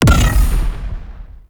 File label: Detonate Explosion, Glaive; Glaive Ghost Catcher; Warframe; Weapons